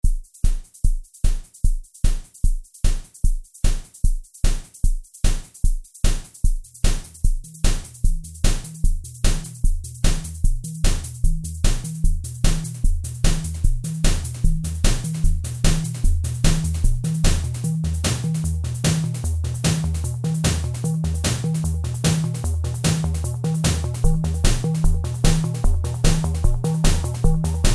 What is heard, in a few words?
drum machine